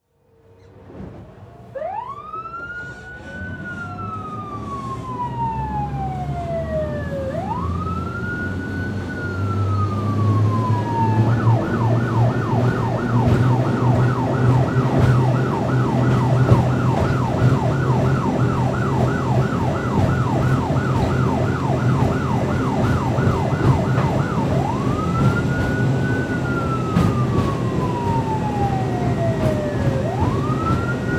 Transportation Patrol Boat Drive On Open Water Interior Sirens On